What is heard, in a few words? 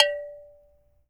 CUP GONG, Cameroon; AKAI S6000 CD-ROM - WorldSounds; CAMEROON PRC